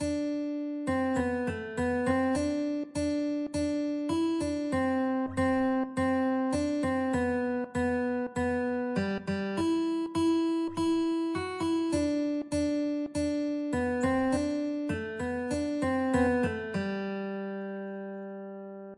guitar

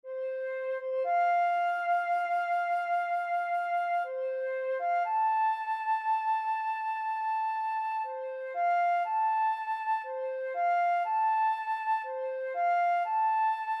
taps, flute

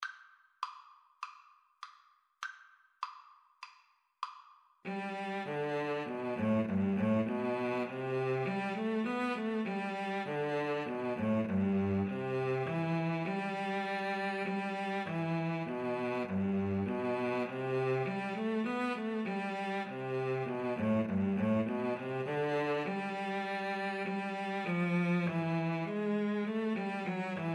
violin cello duet

